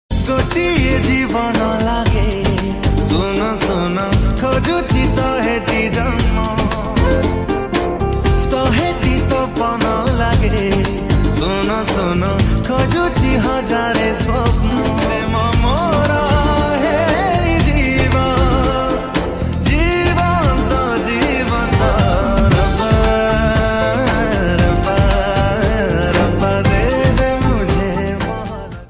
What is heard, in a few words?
Odia Song Ringtone